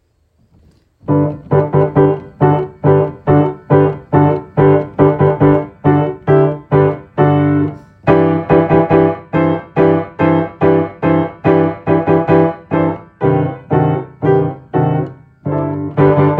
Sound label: Fanfare; Fanfare by Waxman